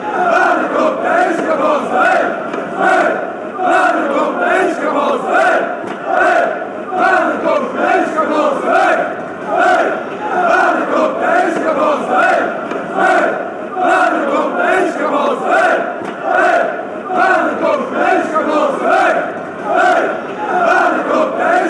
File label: hey hey fan chants